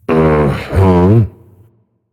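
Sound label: non verbal agree, voice; cernunnos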